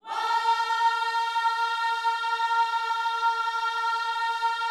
WHOA A#4, Vocal Planet Gospel; Spectrasonics; Vocal Planet Vol.1 Gospel; akai

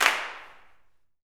CLAPS, Gospel; SNAP; Spectrasonics; Vocal Planet Vol.1 Gospel; akai

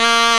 SAX TON; E-Lab; X Static Goldmine 2; akai

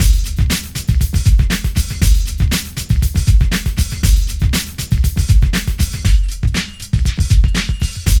PHAT LOOP; E-Lab; X Static Goldmine 2; akai

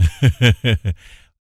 LAUGHS, Jazz Fx, Vocal

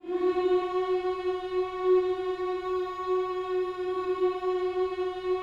"hmms" F#4, gospel; Spectrasonics; Vocal Planet Vol.1 Gospel; akai

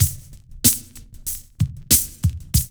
LOOP; E-Lab; X Static Goldmine 2

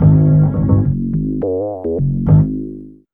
B LOOP; E-Lab; X Static Goldmine 1

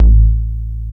BASS

